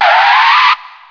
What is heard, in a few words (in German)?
bremsenquietschen